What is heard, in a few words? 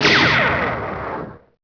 stun Bubble; Feeding Frenzy